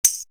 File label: Tambourine; AMG; Perc One Shots